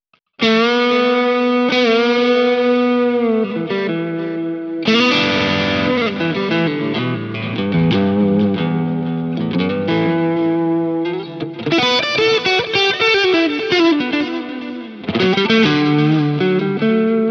Harley Benton DNAfx GiT Pro Single Star OD Modded

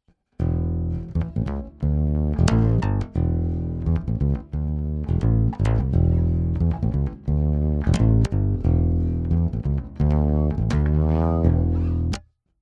High Z In Bass Fretless HPF; Millennia HV35; Godin

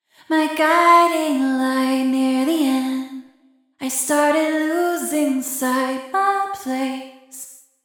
Vocals Recital Hall

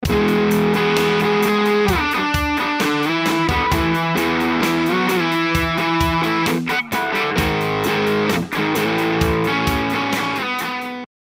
Packrat JHS; JHS Pedals

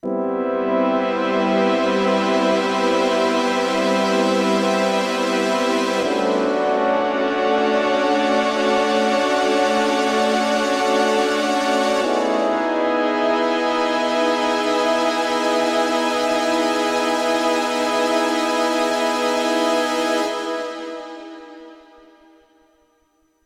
MOD Devices MOD DUO X J6 Shimmerize

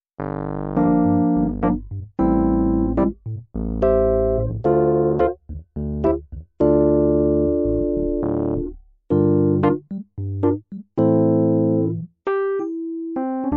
EPiano Upright; NordStage2

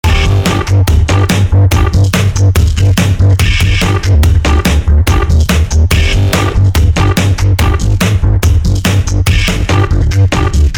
Limiter Ozone4

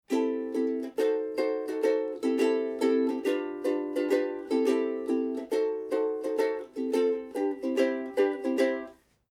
Harley-Benton UK-11-NT-RW Strumming

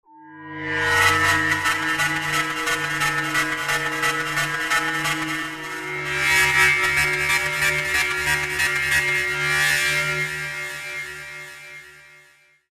TC Warper

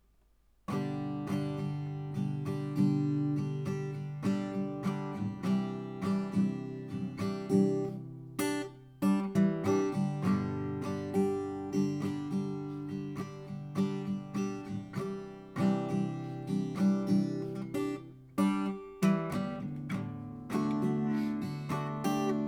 Audient EVOSP8 LCT140 Guitar

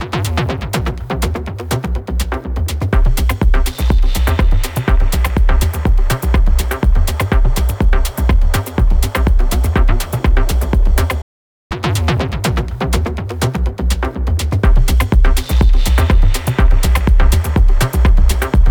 Techno Premaster

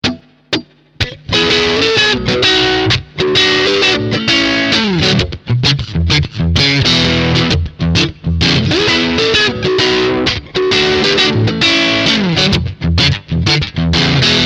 Mid Crunch

